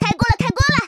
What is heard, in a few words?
ringtone; OnePlus 11 Genshin Impact Edition; xiangling